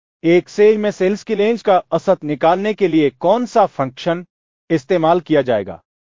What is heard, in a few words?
techno